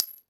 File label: mg casing impact